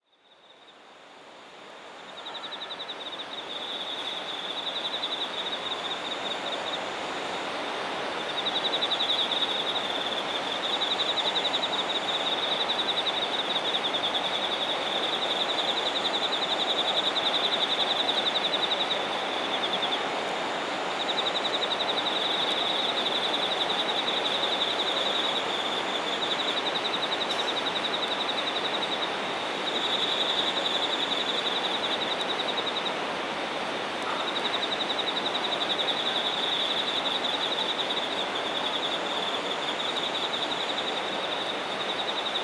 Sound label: shinagawa singing insects